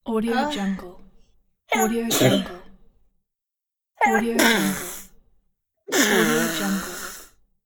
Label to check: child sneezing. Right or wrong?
Right